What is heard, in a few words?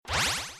enchant; Ben 10 - Tomb Of Doom